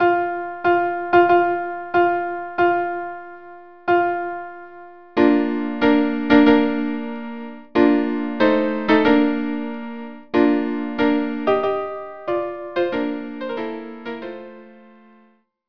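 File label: violin duo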